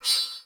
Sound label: Beep Comby; Warframe; Corpus